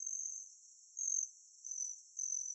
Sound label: hummingbird